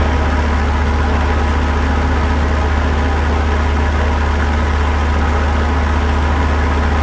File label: bomber cockpit, engines; War Thunder